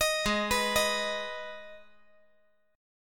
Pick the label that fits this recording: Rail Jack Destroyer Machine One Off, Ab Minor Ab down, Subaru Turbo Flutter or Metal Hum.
Ab Minor Ab down